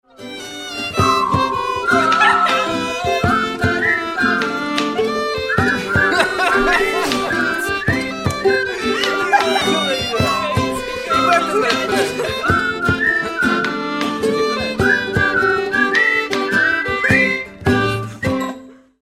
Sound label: Hornpipe ukazka